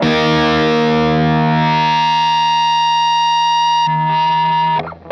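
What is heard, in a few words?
fuzz guitar vibrato; MAYFUZZ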